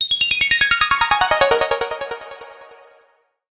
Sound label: KORG MINILOGUE SCALE; Crowdsourced Sample Pack; Digital FX